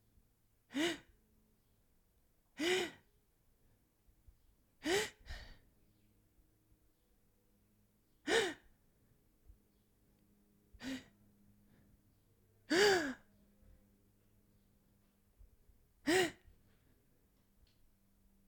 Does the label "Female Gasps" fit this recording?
Yes